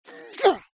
cartoon character cute high pitched struggle strain